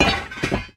grab sword

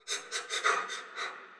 town scared breathing; soundscapes; occultists

